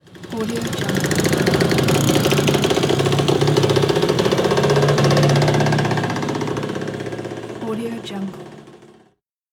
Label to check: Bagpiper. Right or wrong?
Wrong